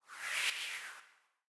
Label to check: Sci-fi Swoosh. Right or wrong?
Right